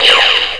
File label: firing